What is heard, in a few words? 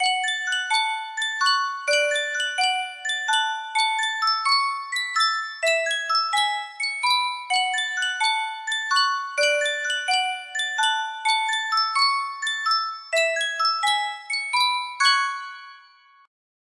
music box